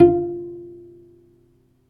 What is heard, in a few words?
Cello F4